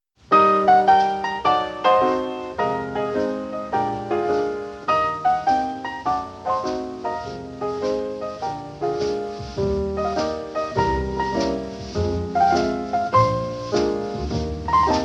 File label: Swing